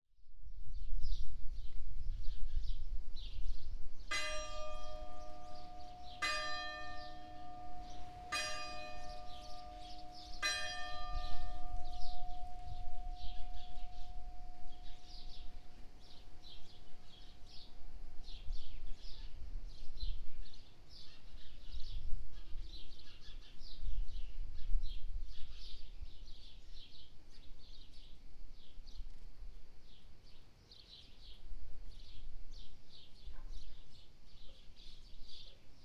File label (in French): Temple 16 h; Collorgues